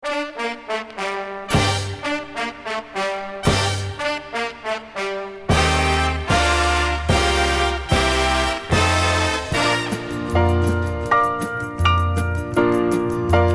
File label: piano